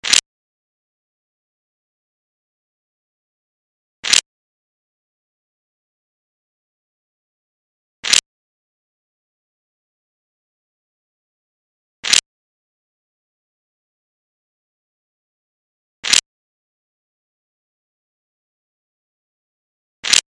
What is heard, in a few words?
Chip Stack; ringtones; Las Vegas